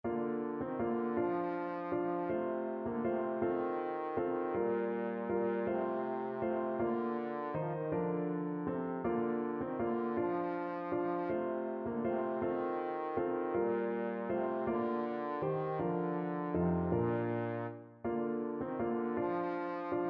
sonata, trombone

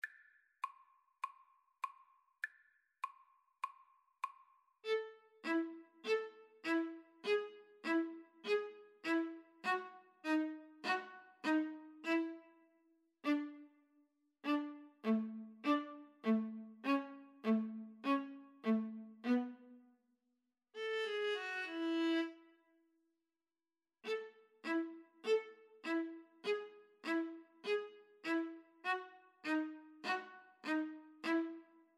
rag, violin viola duet; 24th st rag

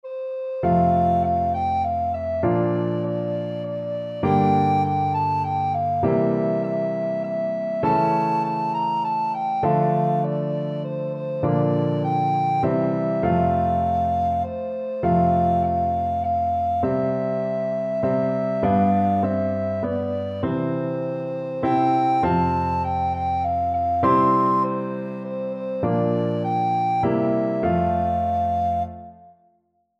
we wish, alto recorder

